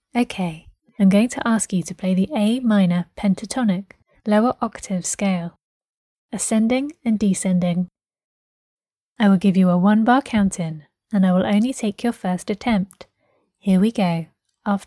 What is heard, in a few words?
a minor pentatonic lower both